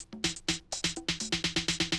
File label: Classic DrumLoops, 125